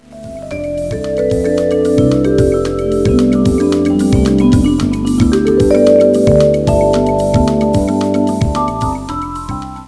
arpeg cho

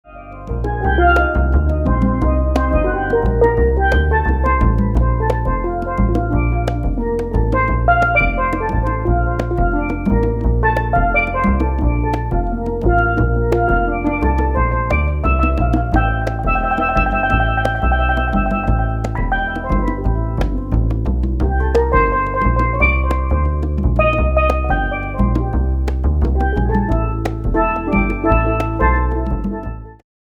bass perc trio

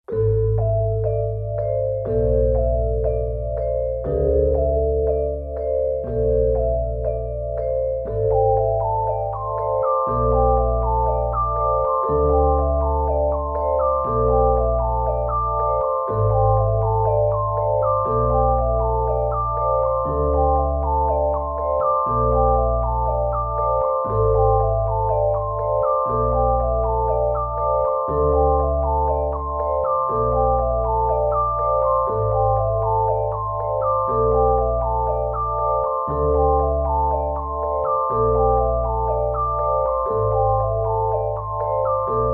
gamelan

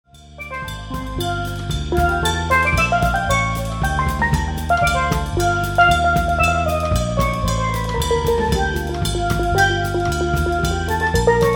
bass drum trio

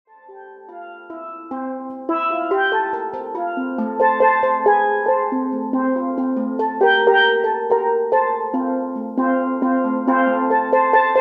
pan solo

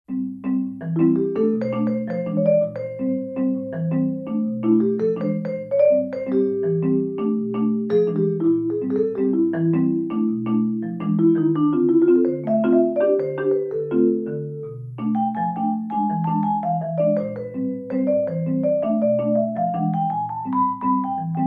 vibe marimba

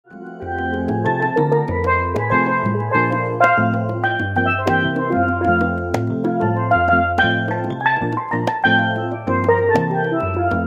key perc trio

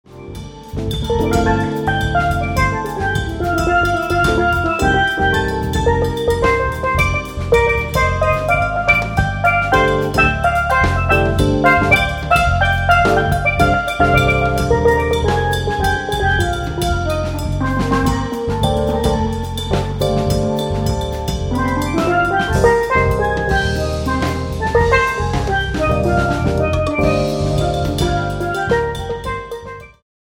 quartet

